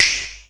SNAP; Crypton; DrumBase5000

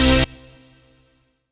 HOUSE, House Dance

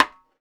CLICK, X-STICKS; Sampleheads; New York City Drumworks VOL-1; KD X-STICKS

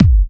Electro Kicks

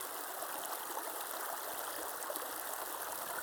Waterway G Light

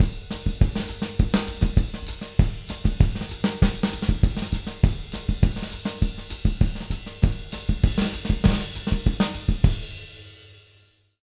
200 SAMBA, Jazz Latin Drumloops; USB Soundscan vol.08; AKAI

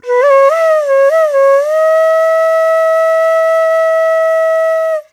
VENU, India Winds; World Combos; E-MU Producer Series Vol. 7 – Old World Instruments

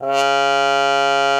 cup mute C#3; Xsample VOL-3

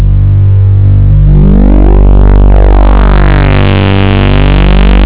filter syn; jazz jackrabbit 2; M8

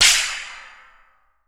SHEET METAL, Definitive Percussion

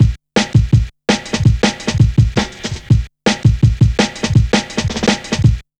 Check A, Jungle, Drum Loops 165.5

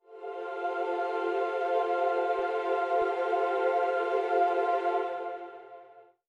choiry pad

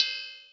ride, Millenium MPS-400

